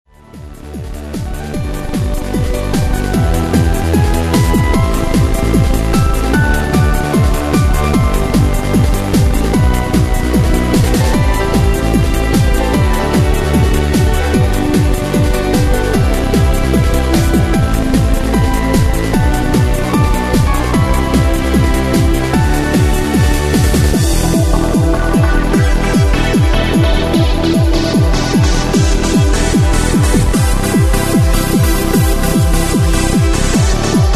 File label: Trance Style; ro field music